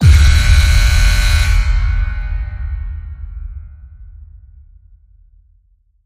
Game Over Signal Boom

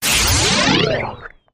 Hollow Distorted Sweep

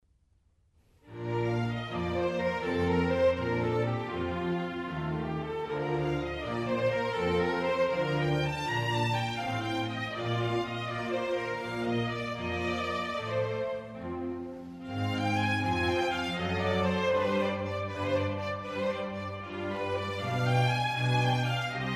Concerto for Strings in G minor; RV152; Antonio Vivaldi

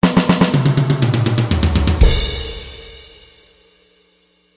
Rock Solo

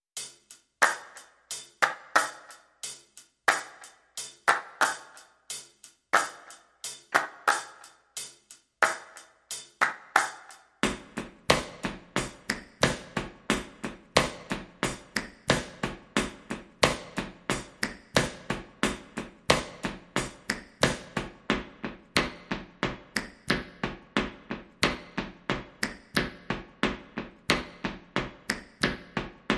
Handclap Stomp Hihat

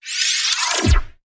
Sharp Modulated Sweep

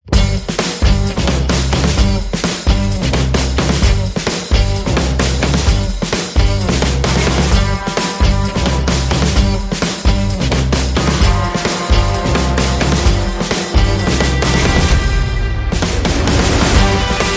Funky

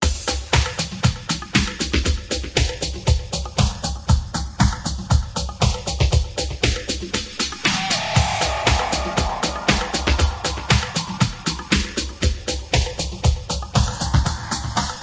Lounge Lizard Drums & FX

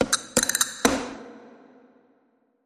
Spanish Flair, Sting

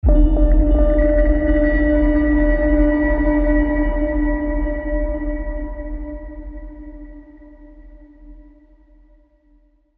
Silent Threat Ominous Ping

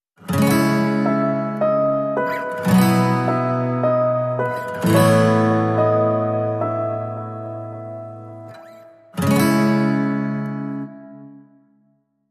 Bright Acoustic Days, No Drums

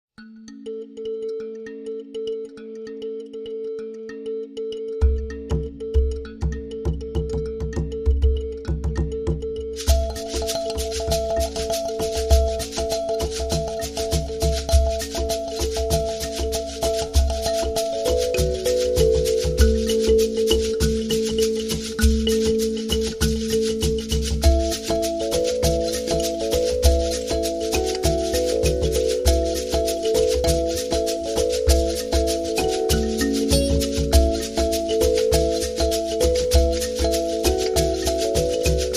Thumb Piano Rhythm

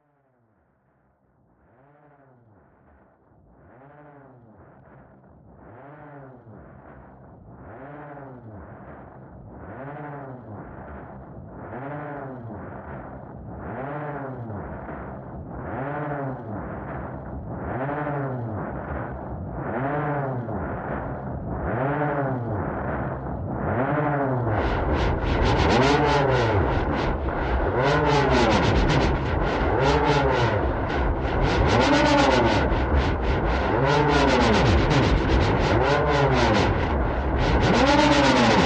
Modulating Synth Only; H H Holmes

